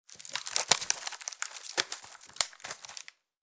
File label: Wicker basket creaking